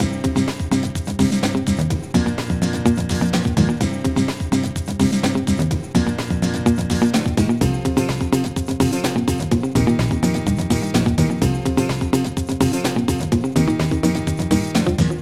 guitar tune; OctaMED SoundStudio V1; mug-members

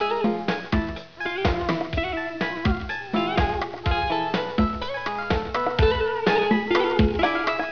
Danceloop closer; WARLOCKSNDS

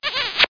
kis big

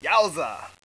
non-comittal noise; Spades Deluxe; Hal

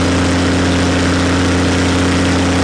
engine; HangSim; beach

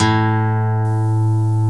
guitar; Timidity; patch; acguitar

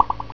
Bounce Med; Diamonds 3D DEMO

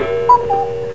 CHIME

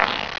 SHUFFLE; MPG-Net (USA); empire

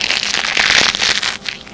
CRUNCH; Education Publishing Concepts

